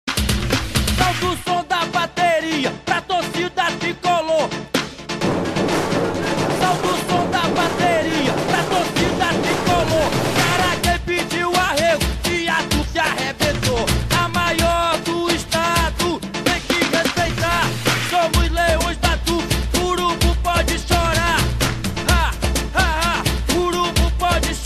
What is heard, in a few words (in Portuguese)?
bateria